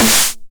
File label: 909 SD Tape Sat